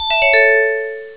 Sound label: chimes high; Sony Ericsson; P900